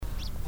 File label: Richards Pipit Ssp Sinensis Call; pipit de richard anthus richardi ssp sinensis